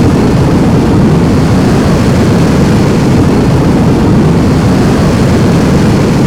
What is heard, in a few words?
rocket; weapons; rpg